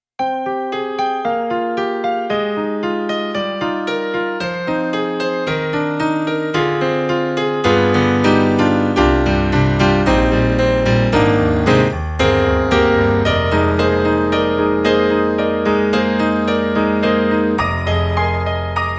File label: Korg Triton-Rack Romance Piano